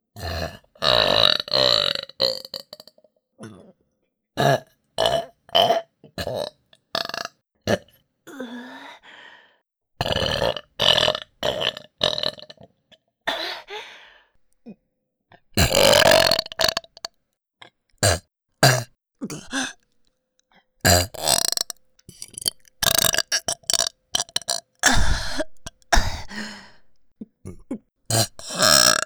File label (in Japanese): ゲップ まとめ